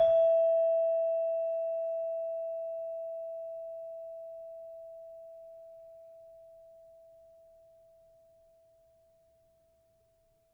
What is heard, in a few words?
Saron 5E, gamelan tuned; gravityharps